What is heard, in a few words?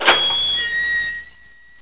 big gate open